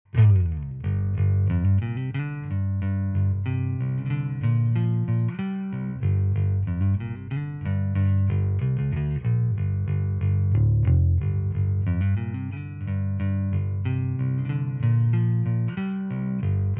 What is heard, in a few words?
Preci Neutral Pick